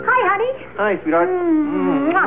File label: kiss; bradybunch